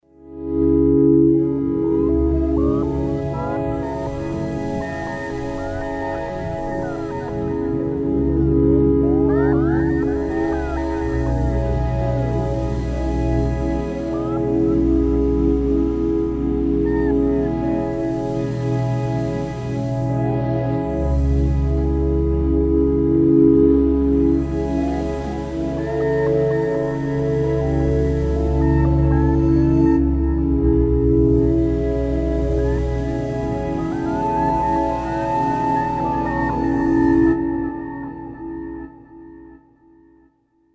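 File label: Swirlite, Kurzweil K2000, HYBRID SYNTH; Kurzweil K2000 Contemporary ROM; Contemporary ROM Programs and Demos diskette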